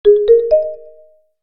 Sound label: Blip, notifications; IUNI OS 3